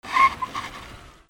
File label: CARS